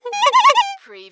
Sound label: Cartoon Clarinet Squeaky